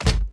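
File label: droid bodyfall lg metal; droids robots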